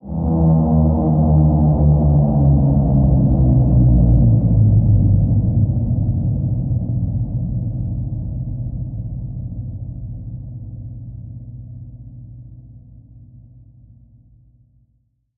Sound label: Expanse Dark Landing